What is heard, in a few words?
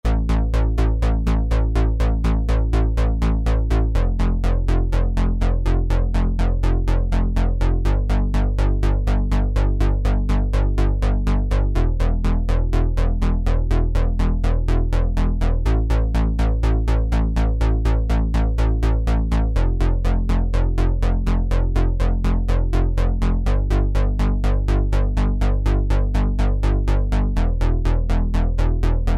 Novation Mid Bass (A F G E)